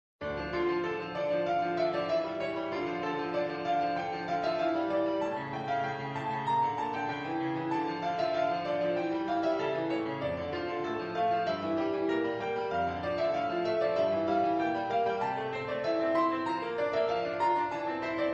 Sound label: sonatina D min